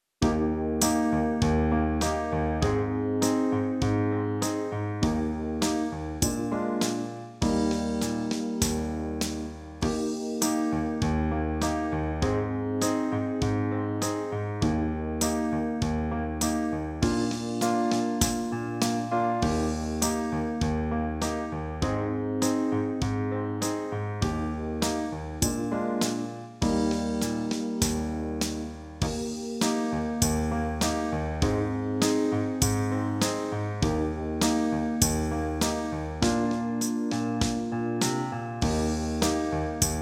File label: There Back brass